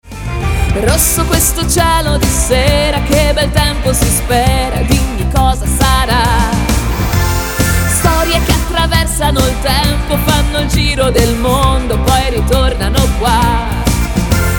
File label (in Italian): tarantella